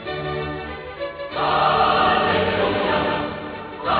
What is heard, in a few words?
echo; handel